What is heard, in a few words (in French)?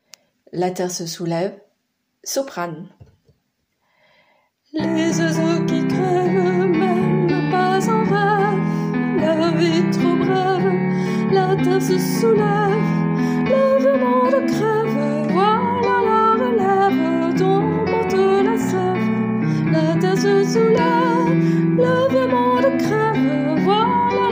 soprane